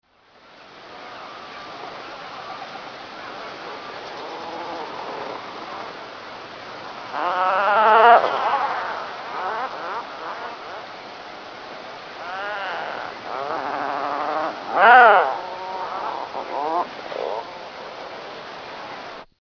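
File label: tufted puffin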